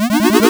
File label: Tetris Block; MediaPortal 0.2.3.0; BlueTwo